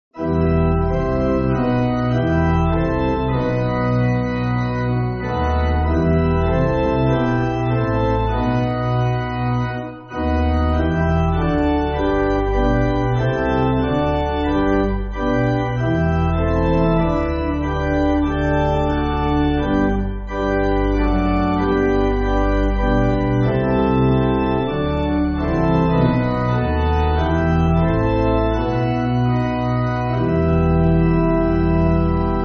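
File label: Pipe, Music Backing Files for Churches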